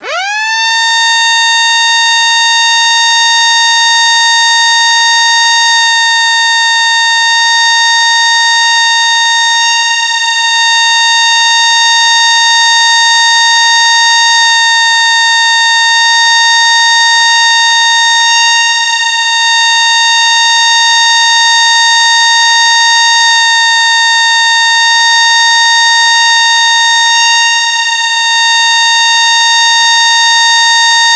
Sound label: siren